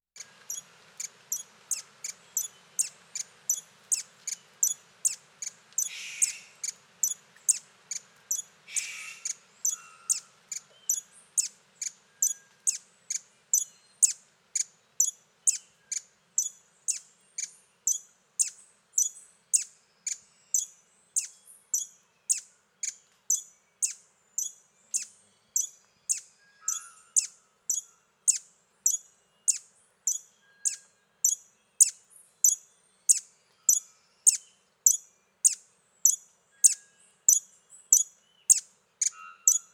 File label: Song at lek, Birds of the Costa Rican Cloud Forest